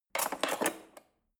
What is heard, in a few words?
cutlery fork drawer handle scrape soft